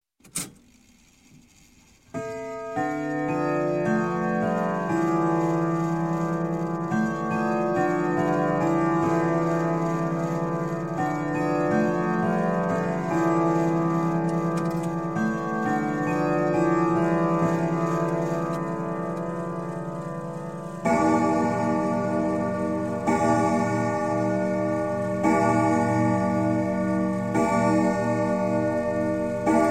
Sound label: Clock, Grandfather Winchester Chime - Strike El; Strike Eleven; Sound Ideas 2000 Series